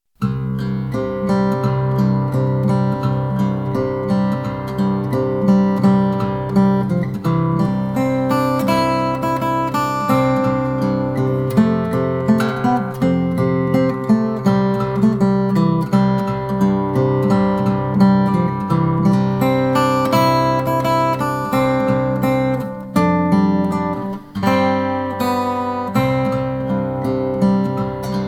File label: Instrumental; John Browns Body; Battle Hymn of the Republic